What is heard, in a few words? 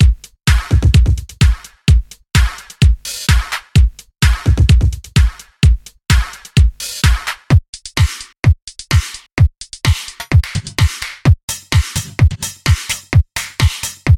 electro